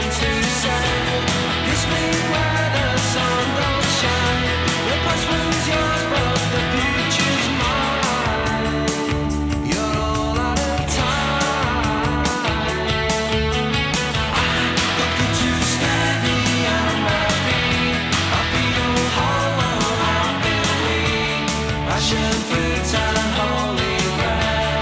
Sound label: rock